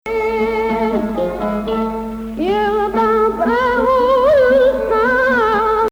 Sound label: Nahawand, maqam